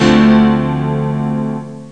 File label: house piano dj7, techno stabs; Terra Sound Library